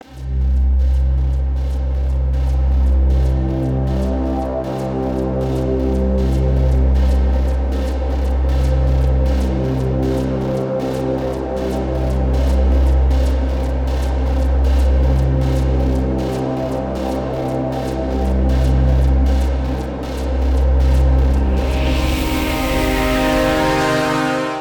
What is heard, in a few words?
Intro Music Bed 156bpm; Drill Boss